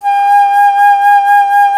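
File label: flute, alto flute; Miroslav Vitous; Solo Instruments VOL-2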